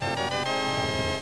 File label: video game